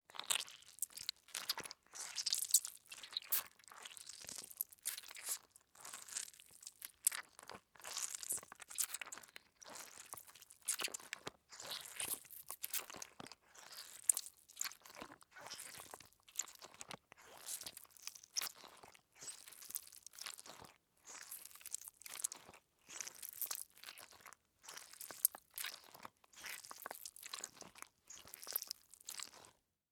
Slug Crawl Move, Slug, Crawl, Movement, Slimy, Wet, Long, Insect, Bug; Slugs; Sound Effects:Animals:Insects; Blastwave